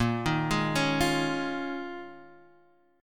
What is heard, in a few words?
D sharp 7th Suspended 2nd Suspended 4th A sharp, Guitar